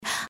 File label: breath; pony; Twilight Sparkle